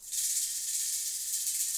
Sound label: ROCKET, SHAKER FX; Spectrasonics; Heart of Africa 1